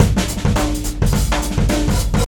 DRUM 107

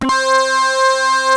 PPG C4, synthesizer; Hollywood Edge; Giorgio Moroder Rare Synthesizer Collection; PPG.2.3

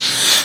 BREATH, Female Vocals; PlatinumPhattCD2; E-MU Producer Series Vol. 8 – Platinum Phatt

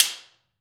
SLAP STICK; AKAI S-Series CD-ROM Sound Library VOL-5

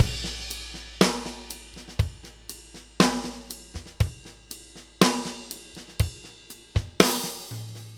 New York Loop, Drum Tools, Laidback

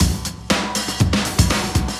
breaks, 120bpm; free bespoke breaks; BeatStyleG

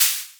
Shaker; Equipped Music; Loungin House

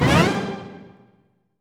Pitched Sting; Orch Perc and Stings; cartoon; musicradar; cartoon caper

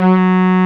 M8 ST G3, ANA STR

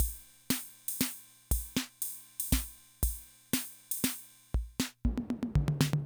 Loop, rhythm 55, classic machines

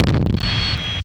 KIK, Drums; Distored Reality 1&2

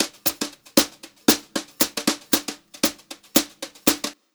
Brushed Drum Loops, JAZZY

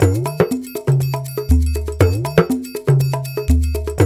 120 AFRO, African Contemporary, Afro Triangle; Spectrasonics; Supreme Beats

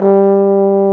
BRS BSBONE, Bs.Bone Solo; Roland LCDP06 Brass Sections; Bs.Trombones

